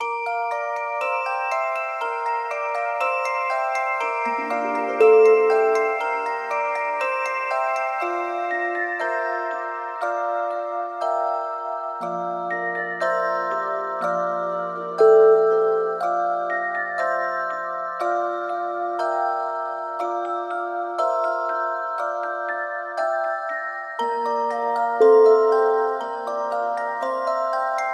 magical toy box

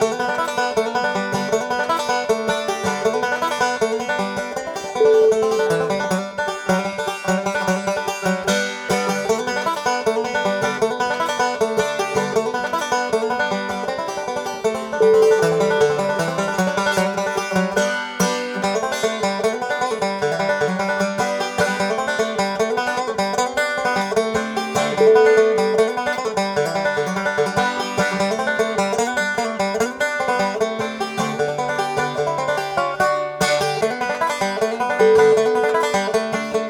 banjo gold

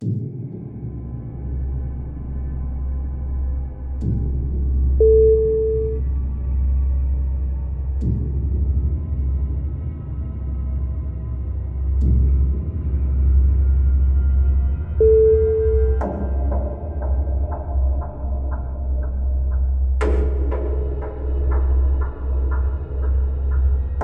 horror ambience

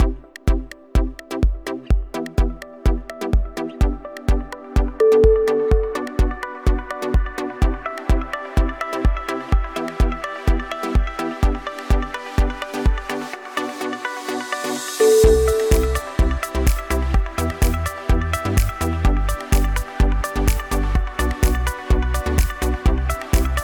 upbeat corporate

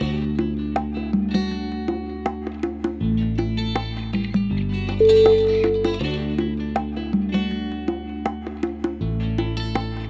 acoustic relaxation

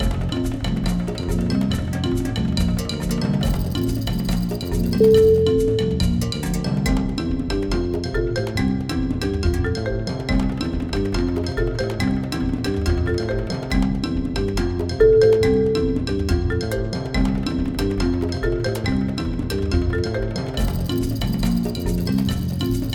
tribal party

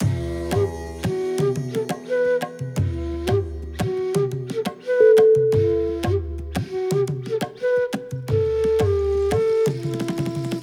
indian concrete jungle

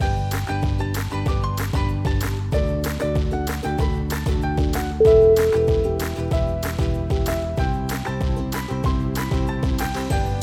ukulele drive

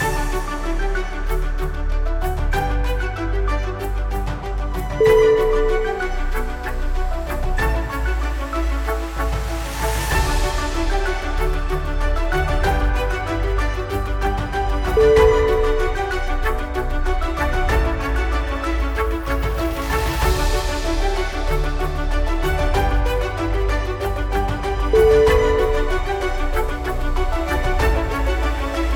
happy inspiring violins

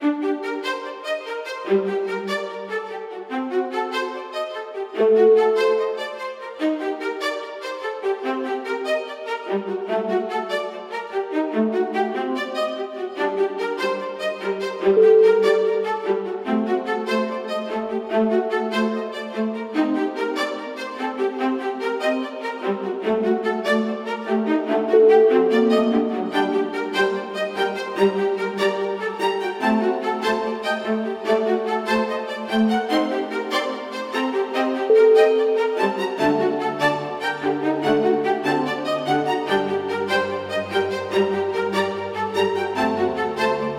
classical drama

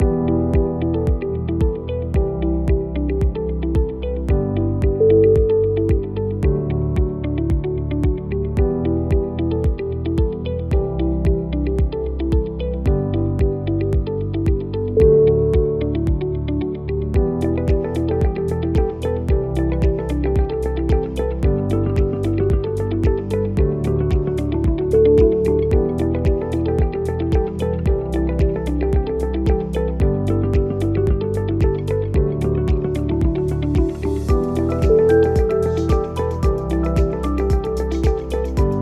tech calm

